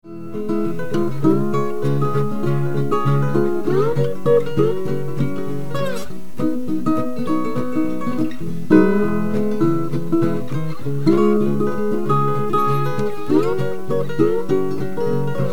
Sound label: Three Guitar Rag